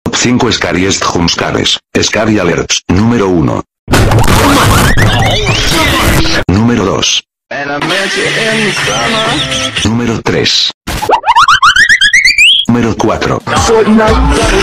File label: scaryyyyyyyy